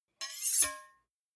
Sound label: two knives large scrape short